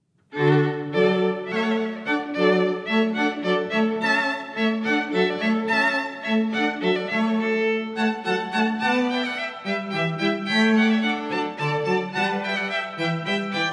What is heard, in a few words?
hornpipe; Handel; The Water Music Suite no.1